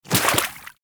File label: Inf Small Walk Water, Infested, Footsteps; Enemies; Warframe